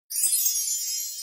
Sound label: sound stars; Wheel v4